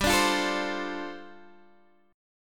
G 7th Suspended 2nd Suspended 4th, G, Guitar, Open G